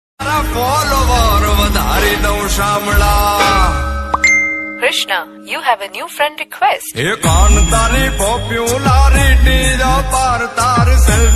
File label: gujarati ringtone